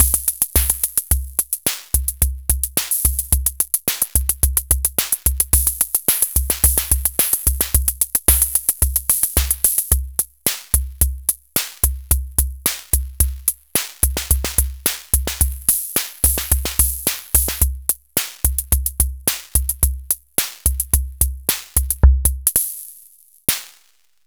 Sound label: Univox Micro Rhytmer12